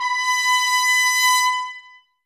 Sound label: BRS TPS, Brass; 90 s Sample CDs; Miroslav Vitous; Woodwind Brass Ensemble